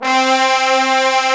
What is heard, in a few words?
BRS TBNS, Bone Sec ff; Roland L-CDX-03 Disk 2; Bone Sec.FX